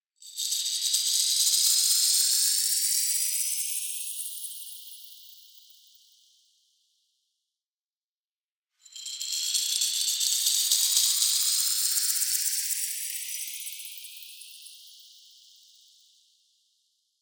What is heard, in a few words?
SHIMMER Ascending Metal Delay Sparkle; Anime Essentials